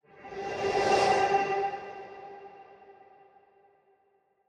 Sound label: Slide